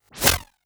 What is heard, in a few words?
Glitch, noise